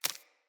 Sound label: Root Spider Step Dirt, Foley; Animals